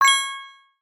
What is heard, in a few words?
Notification Bells Sound